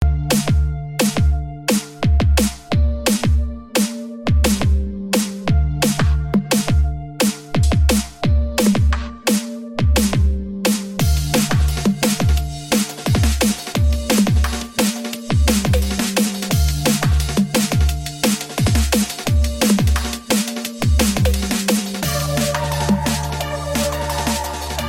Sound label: A Simple Beat With MPC Studio